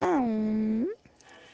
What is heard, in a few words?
Awwwww